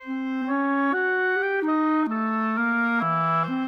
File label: Clarinet Oboe, Orchestral; Sonic Foundry (Sony Creative Software); Orchestral VOL-2